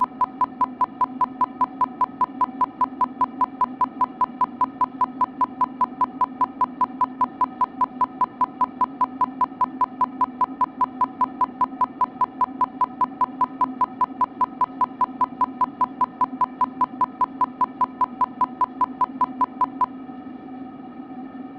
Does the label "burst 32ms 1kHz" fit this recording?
Yes